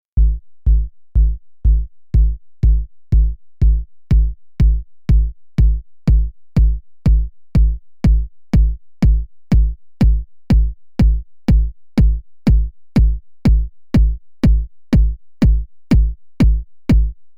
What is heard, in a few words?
Jomox ModBase 09 MK2 Gate Time